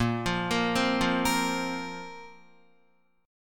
Bb Suspended 2nd Bb down Guitar; arpeggiated

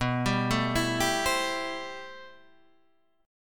A-Minor 7th B; Am7/B arpeggiated; JGuitar

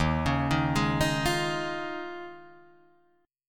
Eb 9th Suspended 4th, Guitar, Drop D

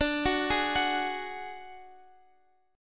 D Major Flat 5th D Guitar Open F, chord; arpeggiated; JGuitar

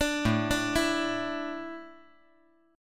A Suspended 4th A, chord

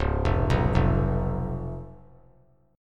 Db 7th Db down; Db7 arpeggiated; JGuitar

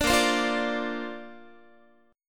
A sharp Major Add 9th, Guitar; A#add9; strummed

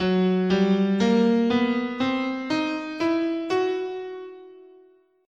Gb Oriental, scale

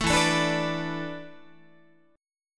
E 7th Suspended 4th, Guitar; E7sus4; strummed

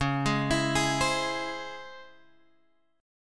Db Minor Major 7th Db Guitar Drop D

